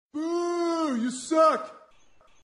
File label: boo you suck